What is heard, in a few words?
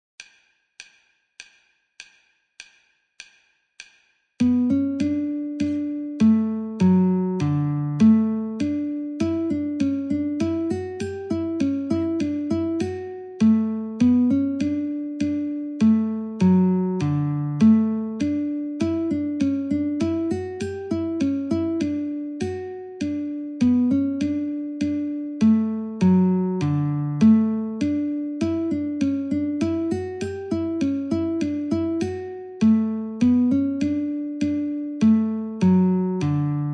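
Guitar metronome 50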